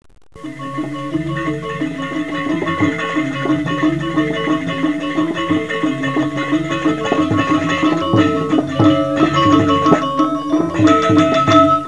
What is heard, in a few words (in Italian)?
suonerie musica ritmica; rhythm